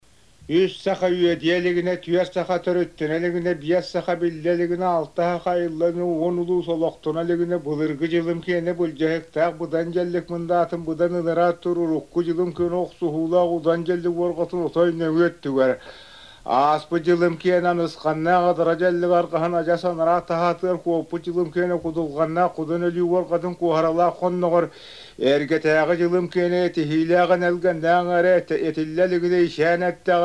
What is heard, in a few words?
introductory recitative